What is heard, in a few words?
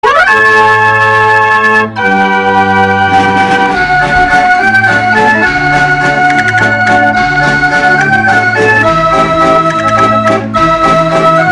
barrel Organ Orchester